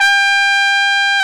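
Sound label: trumpet, jazz; Roland L-CDX-03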